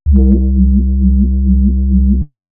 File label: droner, Synth